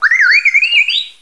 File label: talk; Star Wars; R2-D2